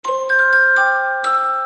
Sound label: Message Love Ringtone; WhatsApp